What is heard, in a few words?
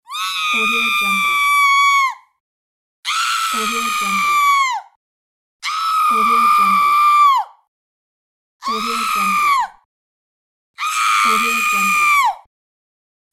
female scream